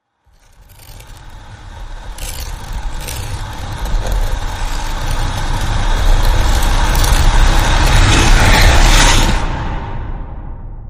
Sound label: Alpine Rush Rise